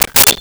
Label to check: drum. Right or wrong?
Wrong